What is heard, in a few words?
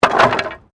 Wood Fall